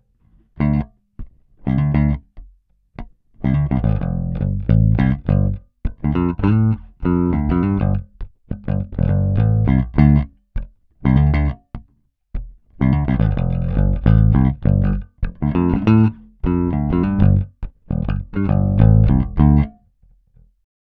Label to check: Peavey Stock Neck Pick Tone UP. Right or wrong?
Right